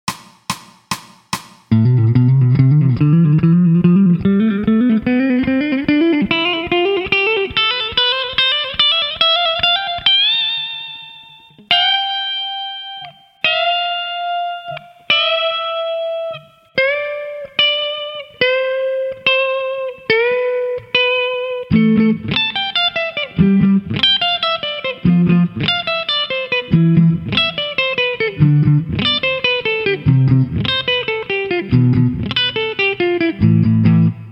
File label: Technical Study, electric guitar; Technical Study 2